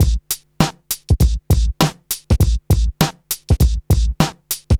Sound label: vocalizer, drum loops, funky